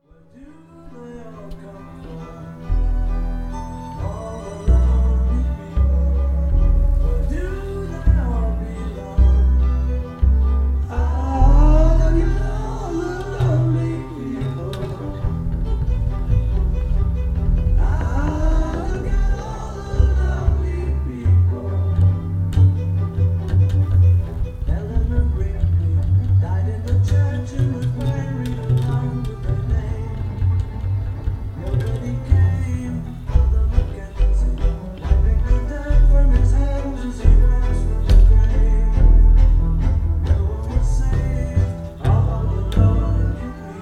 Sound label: Beat Bass